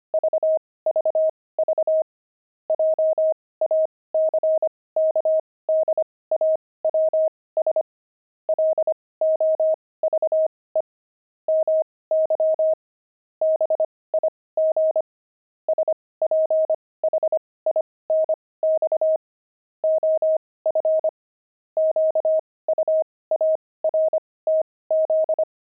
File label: pangram, intermediate pangrams files; jackdaws